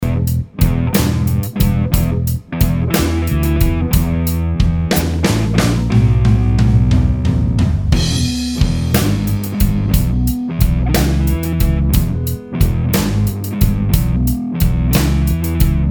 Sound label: slide backing, guitar